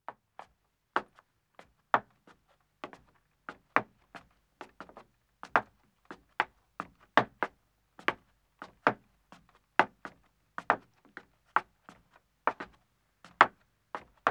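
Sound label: Wooden Stairs Ascending, 2 Women, footsteps; BBC Sound Effects Library; Footsteps II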